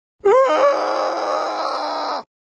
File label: ahhhhhh